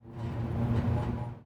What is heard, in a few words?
electrobolt hum, weapons; warfork